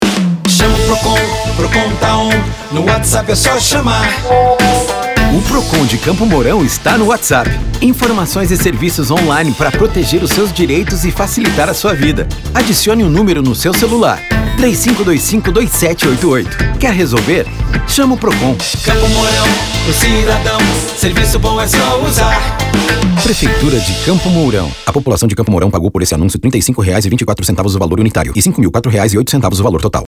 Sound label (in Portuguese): JINGLE; PROCON; casa da comunicacao